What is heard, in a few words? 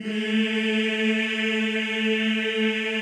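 choir A2, men; Sound & Vision - Gigapack I CD 2 (Roland); Men-Combi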